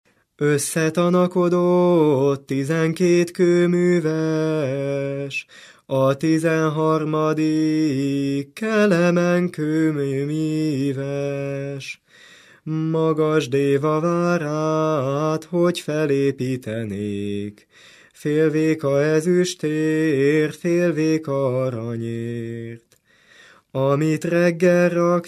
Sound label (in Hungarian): nepdal; osszetanakodott 12 komuves; nepdalok